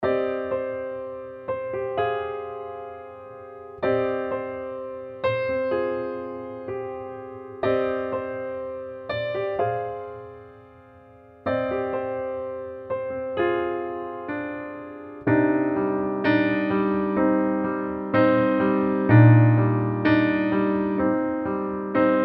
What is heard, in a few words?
Studio Grand Ballads Standard; EZkeys; Ballads 2